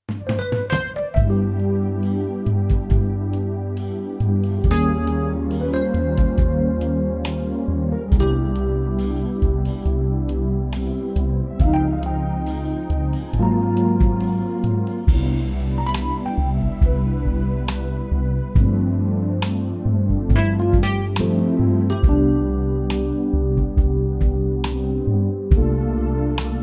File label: smooth C